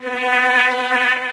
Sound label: jungle empty recycle bin; windows 9x; sound schemes